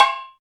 DM5 Perc, Alesis DM5 drum machine

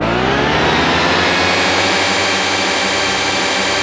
Korg, FX

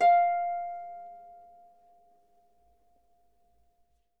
Harp F5; Miroslav Vitous - Percussion & Harp

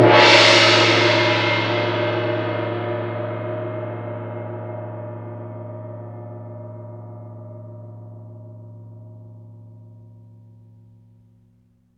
CYM LRG, Gongs Ambo; Roland LCDP03 Orchestral Perc

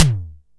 SDS TM, tom; Best Service; Real Mega Drums VOL-1

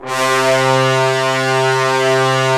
BRS BNSF2AL, Roland LA Composer, Tbns; 90 s Sample CDs

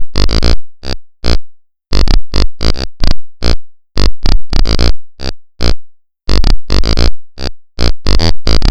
Bass Line; Eclectic Electronica

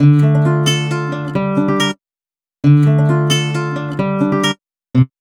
Guitar, cinematic funk; Sonic Foundry (Sony Creative Software); Cinematic Funk Urban Tone Poems

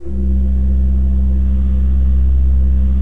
C1, Cathedral Organ; ILIO; Propeller Islands

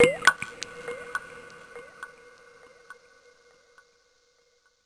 Double Drop; Zero-G; Soundclash Nu Dance Electro; Acidized Wavs; FX Atmospheres

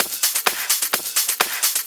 Clubhouse Loop 128 BPM

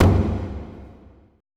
TOTEM, ETH DRUMS

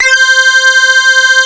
SYNKROR C4, Analog Fantasies; USB Soundscan vol.49; AKAI; YAMAHA

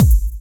BD; Best Service; Dance Mega Drums

